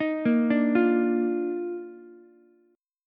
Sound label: D sharp Major 7th Suspended 2nd, Ukulele; arpeggiated; JGuitar